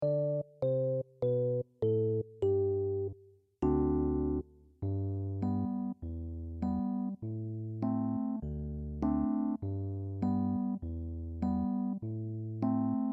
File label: 6 sept song original bass keyboard